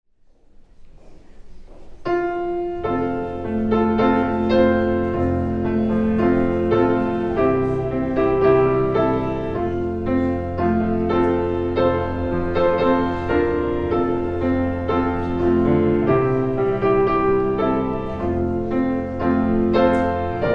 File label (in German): Vorspiel